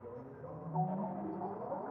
ethno, 125, A

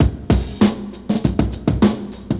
OLD HALL 99, loop; 0- 99 BPM

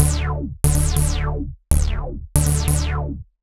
ELECTRO BASS 140 A

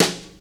BRUSH, JAZZ DRUMSET

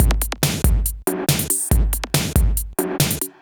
electro beats 140

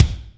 Kick

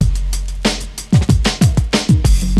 JAZZY B, 93 BPM loop; 0- 99 BPM

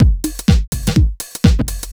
HOUSE BEATS 125

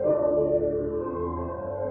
SYNTH PAD ETHNO 125 A, Sphere

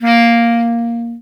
Bass Clarinet A#2; DirectWave; Varazdin Orchestral

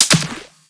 perc; Anthemic.Electro; drum hits

